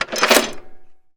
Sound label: Gun Machinegun Auto Heavy Reload; jasem just another shooter with electronic music windows 2017